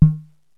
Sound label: YAMAHA, DRUMS PERC; Rubber Chicken Software Sample Bank